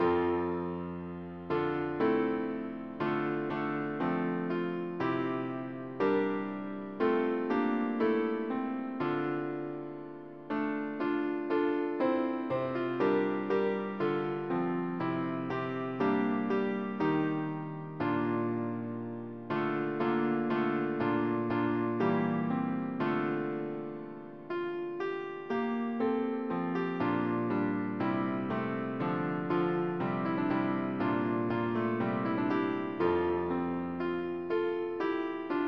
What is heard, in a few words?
D-minor lute; Voi Sapete